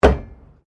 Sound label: PLASTIC BARRICADE CABLE HIT; Material components for constructing more complex sounds